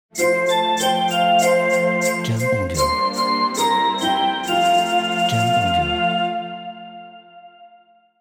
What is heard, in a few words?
Jingle Bells Ident Logo